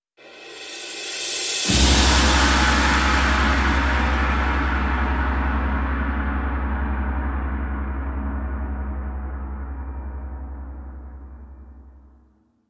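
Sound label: cymbal; Eminent Sign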